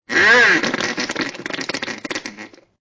Bronx Cheer

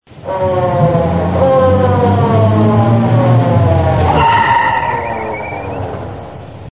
police siren

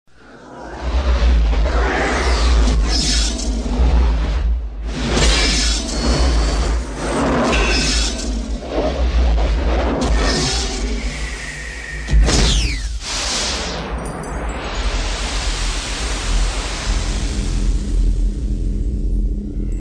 Digital Dts Sound

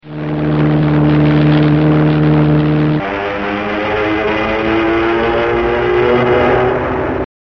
Landing airplanes in

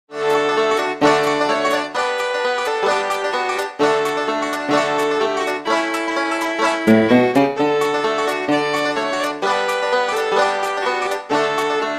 Happy Children Music

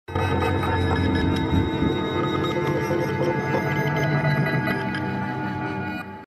Metal Gong

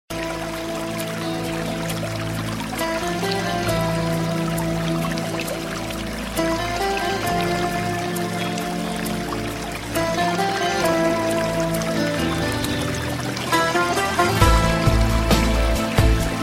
Nature And Water Sounds

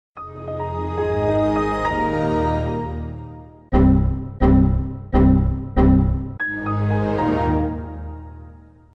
Cool Pc Tone

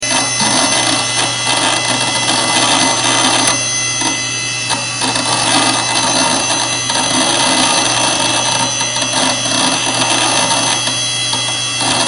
Defective Printer

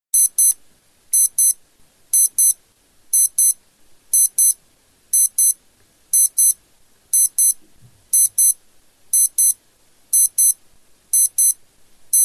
Digital Alarm

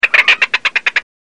Chicken

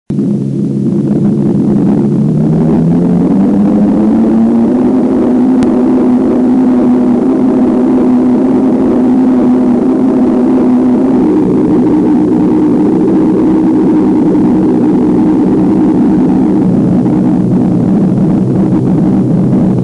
Ww2 Aircraft

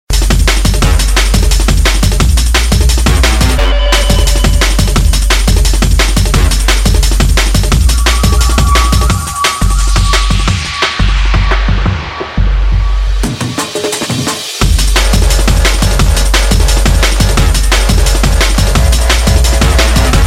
New Drum Beat

